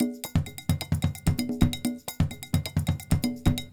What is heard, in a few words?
Loop Percussion 130